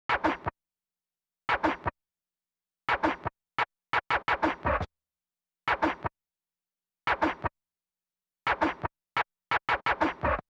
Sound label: Scratch, Hip-Hop